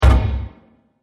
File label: Small Hit; Bursts; Impacts; Crafted Signals